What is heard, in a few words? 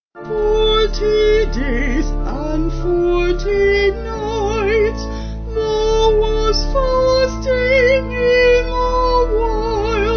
Vocal Organ